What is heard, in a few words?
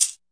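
classic hip hop shaker; Classic Hip Hop Mega Kit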